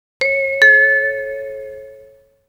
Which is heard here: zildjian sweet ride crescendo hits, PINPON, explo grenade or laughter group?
PINPON